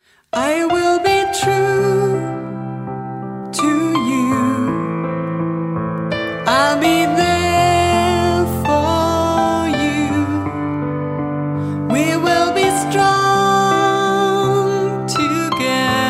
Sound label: Piano